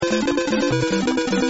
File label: Hurry Star; mario